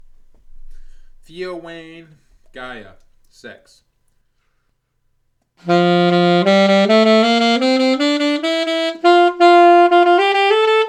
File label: Alto Compare